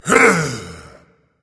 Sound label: yay berserker; cuchulainn